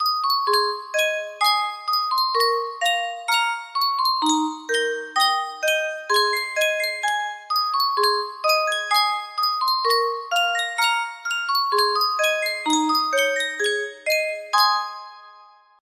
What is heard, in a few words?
Sankyo Custom Tune Music Box, music box melody